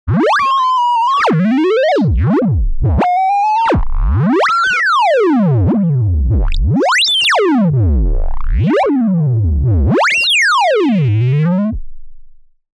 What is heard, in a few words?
LC MS HPF LPF